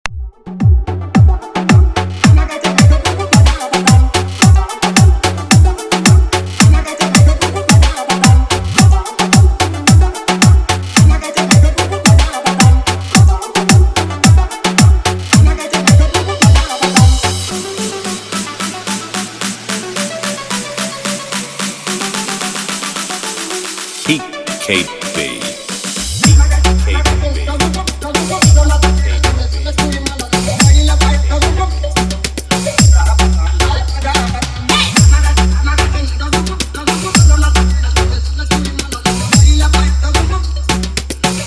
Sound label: Vibration Dj Remix